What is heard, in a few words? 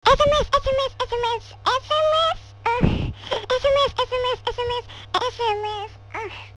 sms